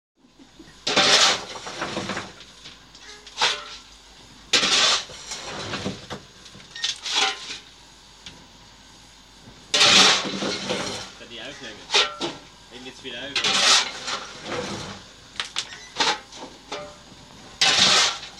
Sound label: Shoveling Coal Engin, steam